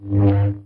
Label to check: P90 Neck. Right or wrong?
Wrong